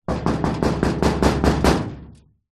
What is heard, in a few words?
impacts metal hollow cabinet old knock; x9